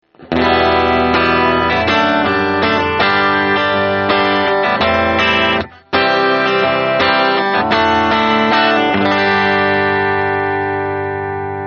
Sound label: small comp